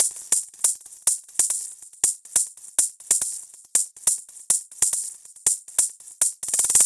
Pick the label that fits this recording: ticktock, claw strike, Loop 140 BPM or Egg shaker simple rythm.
Loop 140 BPM